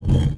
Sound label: stone slide